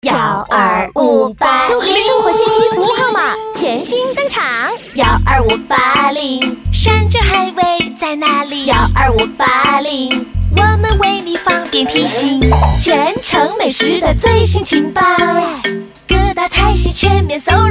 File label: Rap